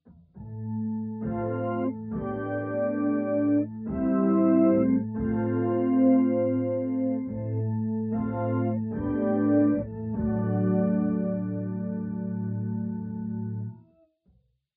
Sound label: SOFT PAD